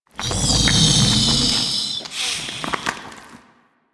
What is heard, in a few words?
Hub Breath; Ambience; Props; Infested Eris; Hub Venor; Warframe